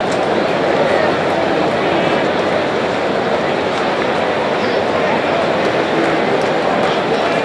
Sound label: Small Audience Idle